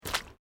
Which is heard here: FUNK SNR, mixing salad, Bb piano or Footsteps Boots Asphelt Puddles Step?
Footsteps Boots Asphelt Puddles Step